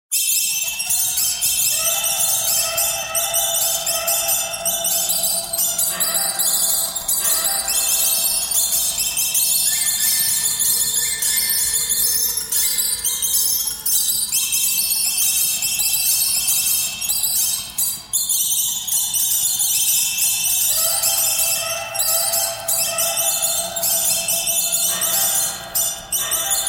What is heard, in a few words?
birds birds thread harmonic